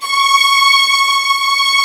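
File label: STRS C#4, strings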